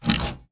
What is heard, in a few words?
bt footstep jog parts; Titanfall 2